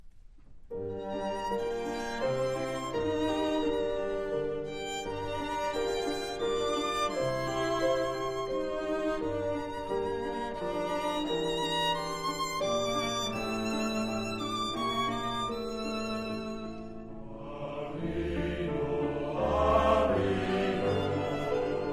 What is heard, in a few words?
Avinu Shebashamayim, choir; ASK 20th Anniversary Concert